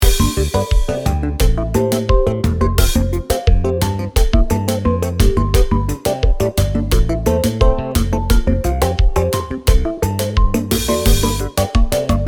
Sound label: Kompa Loop